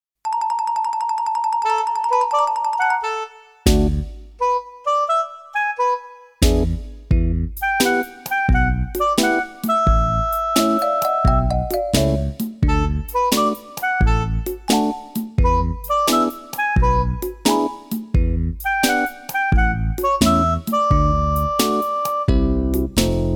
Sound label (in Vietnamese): Nhac nen video nhip dieu tinh nghich; bản nhạc nền có giai điệu bắt tai, vui nhộn